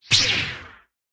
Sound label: lightsword turning on; soundscrate